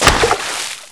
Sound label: water